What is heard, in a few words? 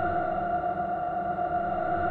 fire spirits loop; weapons; dota; phoenix